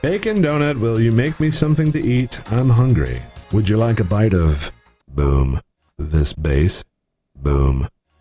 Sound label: bacon bass